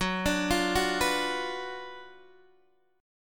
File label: F sharp Major 7th Suspended 4th Sharp 5th F sharp, Guitar DADGAD; chordshape; down